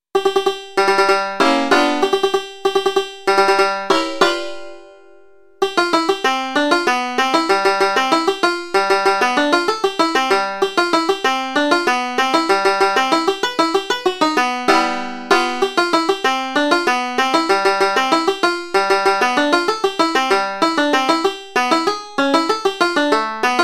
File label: Slip Along Polka